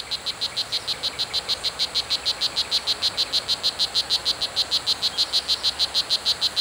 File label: Oophaga pumilio